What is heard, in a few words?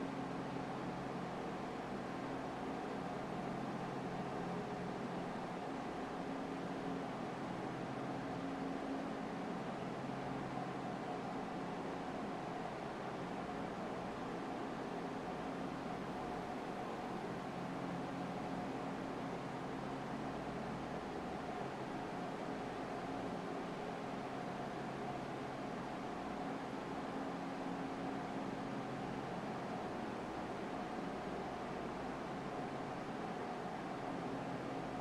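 long steady big sound of production work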